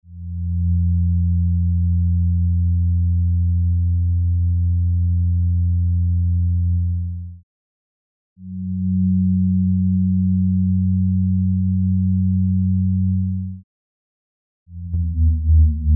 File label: Sonification Of Bread excerpts